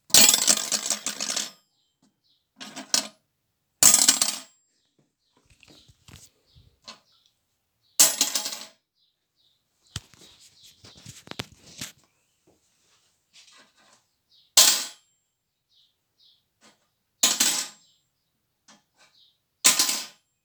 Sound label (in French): metal chute d objet; Bruitages